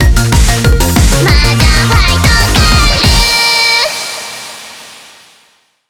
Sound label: BGM Reach